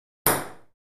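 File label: Ping Pong Single Ball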